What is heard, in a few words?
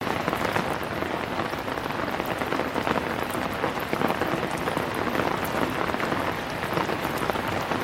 rain umbrella